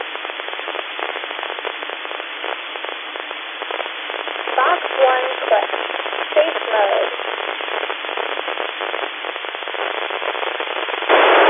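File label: satellite safemode, spacesounds; FOX-1C; SDRSharp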